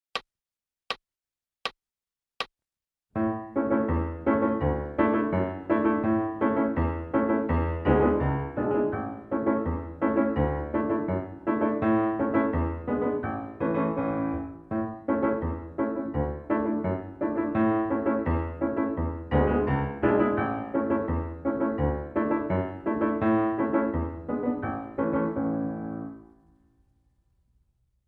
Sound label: Piano; Boil 'em Cabbage Down